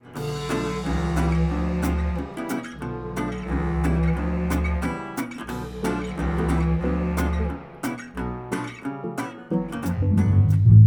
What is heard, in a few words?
Key Item Plants; Plants vs. Zombies Battle for Neighborville